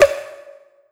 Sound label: Trap Snare B5